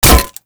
Light Glaive Catch; Warframe

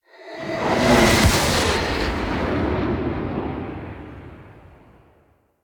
Fly By Quick, Vehicles; Zariman Liset; Flight

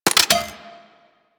Laser Aim Rifle Mech, Weapons; Grineer

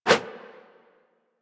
Throwing Dagger Idle Light Whoosh; Warframe; Weapons

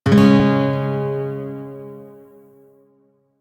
Shawzin Chromatic Chord; Warframe; Lotus Shawzin

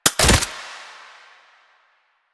AK47 Mech; Warframe; Tenno; Weapons

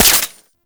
Mech; Warframe; Fulmin Prime

